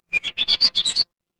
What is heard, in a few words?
animal bird